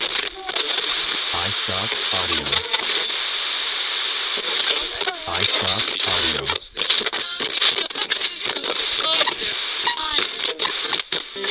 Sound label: fm radio tuning